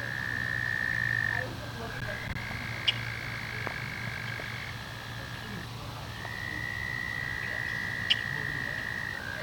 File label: toad sounds